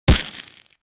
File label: fire, explosives